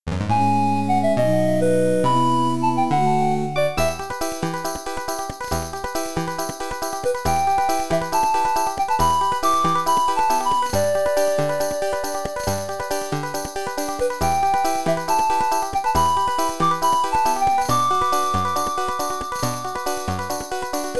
8bit Train Travel; Hyrule Warriors Definitive Edition

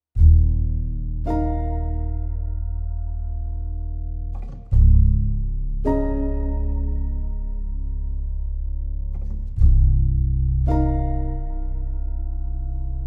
oneshot 102bpm; theme confrontation part01

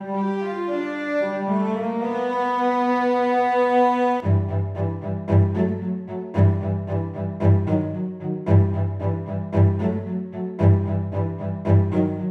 Underwater; Super Mario Bros. 3

